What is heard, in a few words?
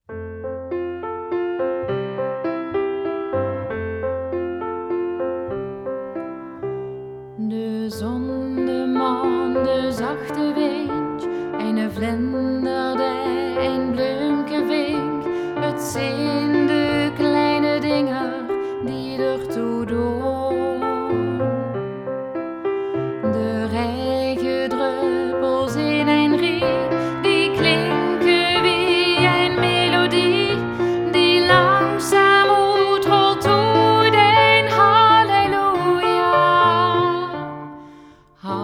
Halleluja fragment